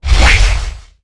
Activate Whirlwind

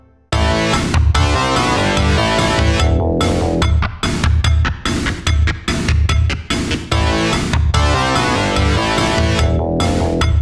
LAUGHING GHOST; legend of hero tonma

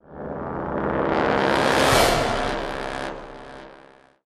BRASS SWELL

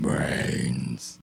groan; Plants vs. Zombies

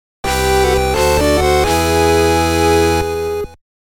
Moment of Triumph 8bit Version; Blaster Master Zero 3

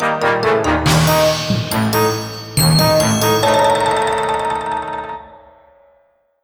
Ui Lose; Shrek Smash n' Crash Racing; PS2

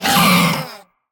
Attack

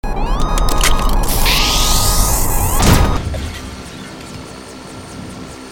Laser; Infiltrating The Airship; Bridge

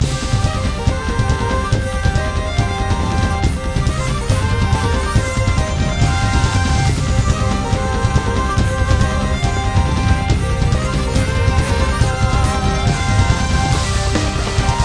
Score Attack Battle Loop

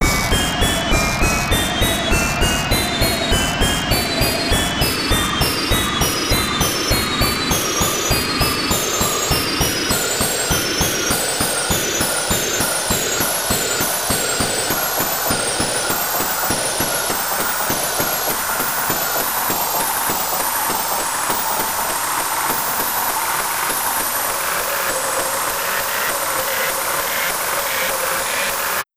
Jumpscare; B3313; n64

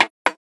TIMER

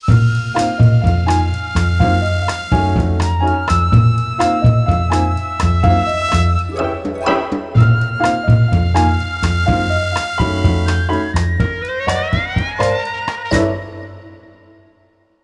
Bari Sax

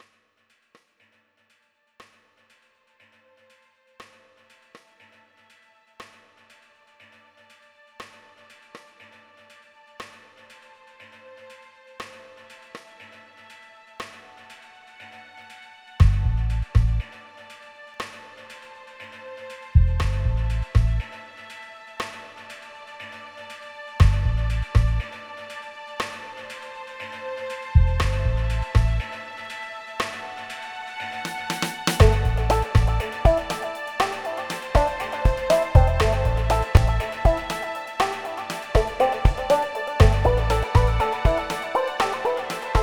Bonus; Interplanetary

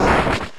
Rock Throw; pokemon reborn e19 2022

